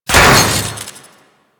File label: Weapon Disarm; Warframe; Loki